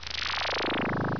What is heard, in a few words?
warble down; A farty descending warble sound.